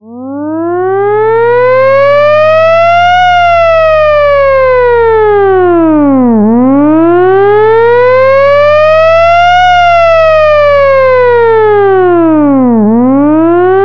air raid wail siren

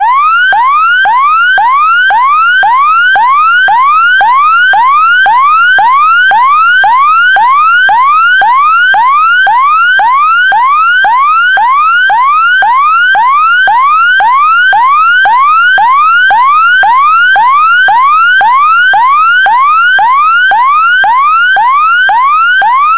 police yelp siren

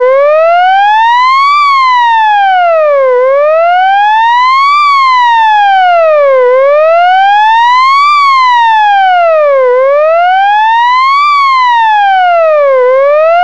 police wail siren

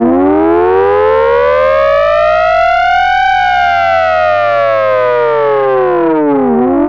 civil defense mechanical siren

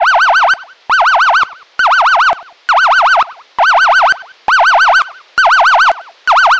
warning alert siren

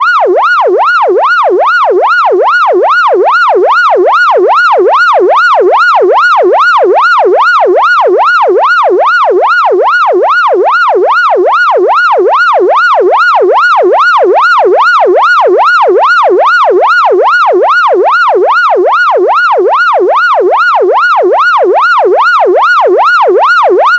electronic siren

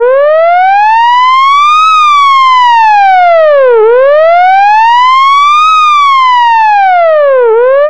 short alert siren

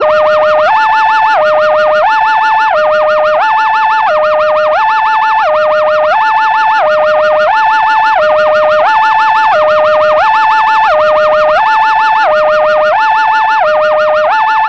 ambulance electronic siren

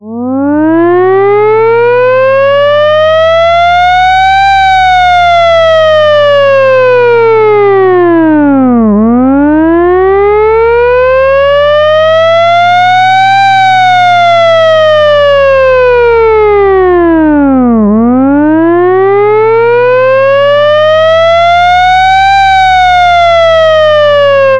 air raid mechanical siren

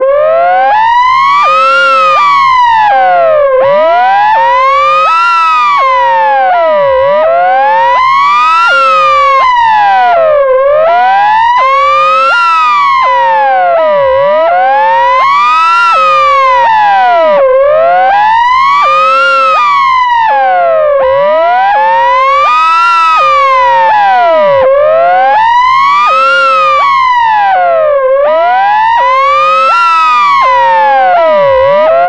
ambulance wail siren

